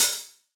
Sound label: HH, Yamaha DJX 2B